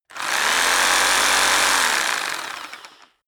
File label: rock drill hammer rev very long; maschinen; bohrer; soundpool